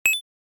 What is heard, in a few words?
Digital beep